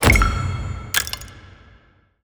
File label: ui draw lots change